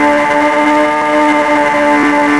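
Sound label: fast loop, engine; ships; Quantum Redshift; XBOX; kumiko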